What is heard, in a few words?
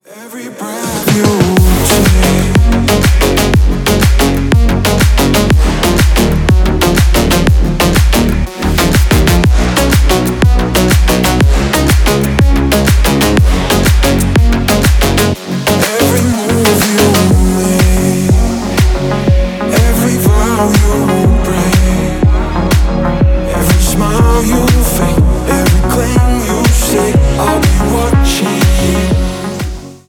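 fierce club track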